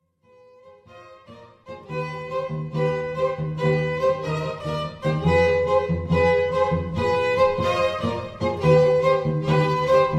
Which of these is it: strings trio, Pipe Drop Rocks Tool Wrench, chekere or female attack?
strings trio